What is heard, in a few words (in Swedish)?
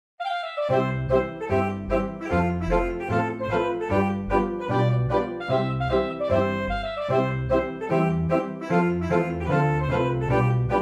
Schottis